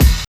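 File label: BD, bass drum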